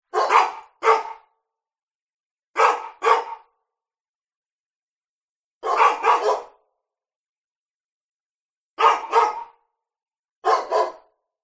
animals dogs x2 labradors bark slight distance echo neighbourhood; zapsplat